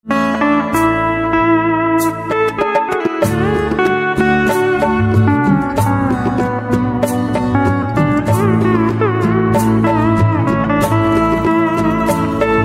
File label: Veena